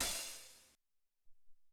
O LoFi Hat, Alesis SR-18

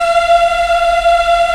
African Mm f; Roland LCDP11 Africa VOL-1

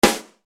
SD, Yamaha RY-30; PONGO

